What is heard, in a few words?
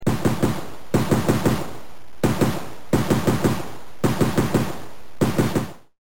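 auto rifle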